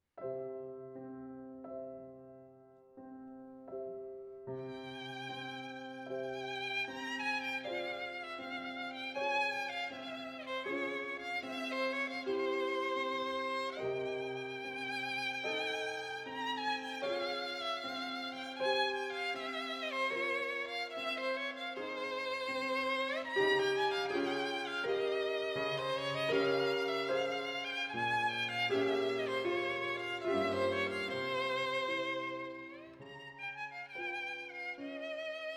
Romanian Folk Dances for violin & piano, Dance from Bucium; Bartók; Buciumeana